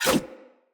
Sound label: Large Dagger Whoosh; Snc Large Dagger